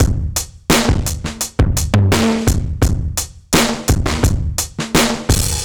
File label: Old Drums 85; 85bpm; Drums n Perc; analogue circuit; musicradar